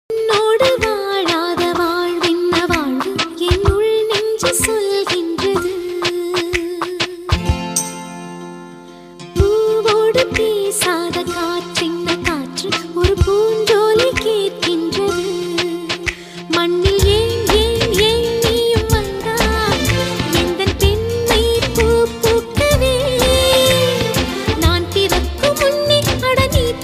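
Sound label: movie tamil love; ajith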